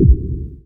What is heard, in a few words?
BD, Dance Mega Drums, BD HOU; Best Service